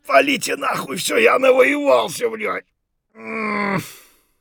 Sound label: hurt near death, voice; Escape from Tarkov